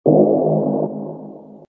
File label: Hit